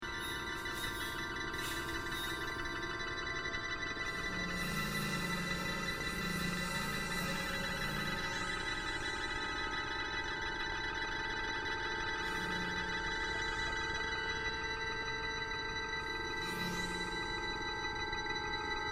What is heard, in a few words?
bell loop